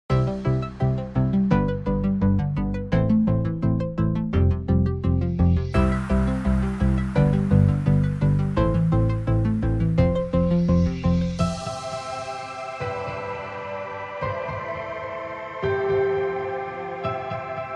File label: Melodic EDM; Geometry Dash